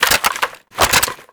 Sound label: reload gdi rifle